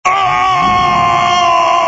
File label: death; hl2dm